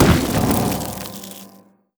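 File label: enchanted crystal arrow; LoL; ashe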